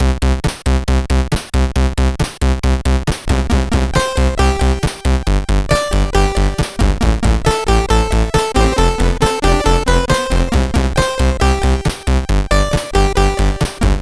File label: sid, CSG8580R5; Deuce note